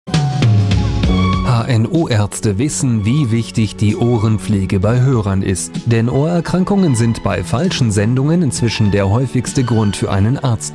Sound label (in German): Jingle; Royal Netradio III